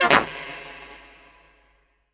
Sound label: SAX, 150 BPM; Ueberschall; Drum & Bass Resonance